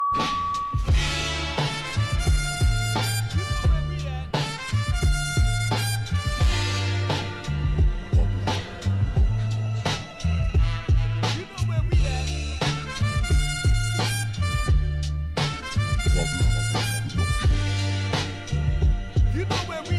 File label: Horns